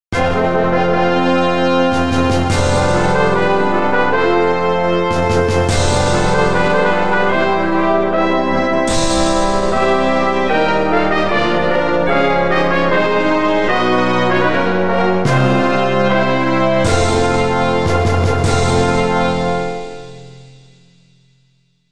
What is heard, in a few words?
fanfare; finale